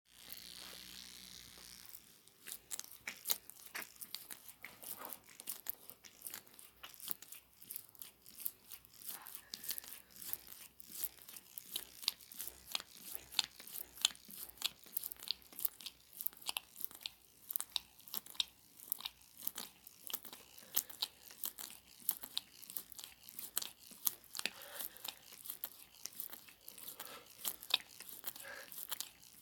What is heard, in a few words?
WET WET